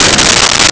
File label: SE Attack; BGM; Nintendo; July 2020 Gigaleak; DrMarioSound